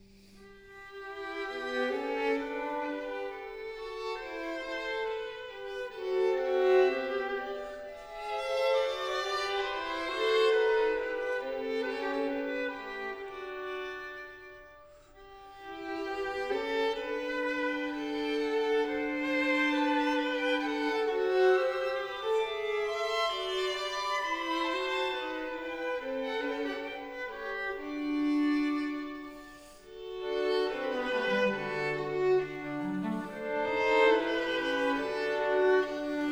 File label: String Quartet in C major; Joseph Haydn; String Quartets, op. 76